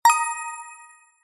multimedia game sound mobile game warm positive twinkle attention alert